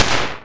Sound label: Handgun One Shot